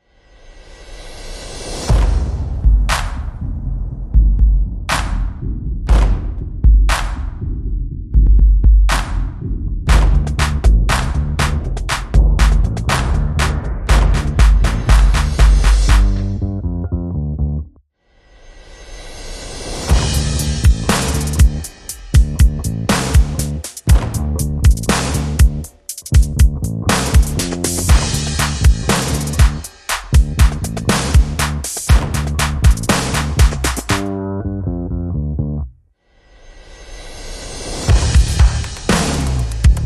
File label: Drums Bass and SFX Only